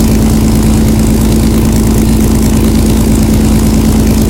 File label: avro lancaster side, engines; War Thunder